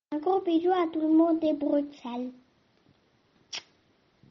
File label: Viola; Brussels